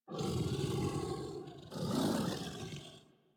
sleep idle yawn, Kubrow; Enemies; Warframe; Tame

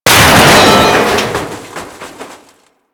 Vent Grate Smash; Warframe; Ambience; Space Battles

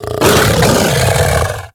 Feral Vocal Downed Tackle; Enemies; Kubrow; Warframe

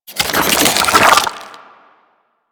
Infested Weakpoint Retract; Warframe

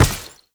Step Default; Enemies; Foley; Footsteps; Warframe; Duviri; Dax

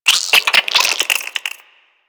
Infested Minion Attack, Vocals; Warframe

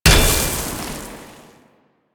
Staff Sand Attack Debris; Warframe; Duviri; Dax; weapons; player abilities

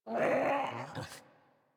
Puppy Idle Yawn; Enemies; Kubrow; Warframe

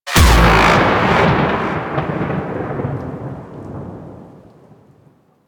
Beam Fire; Mission One; Condrix; Warframe; The New War

